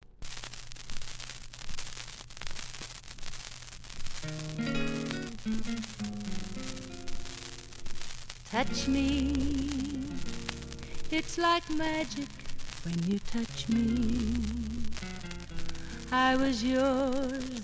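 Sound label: Female Vocal with rhythm section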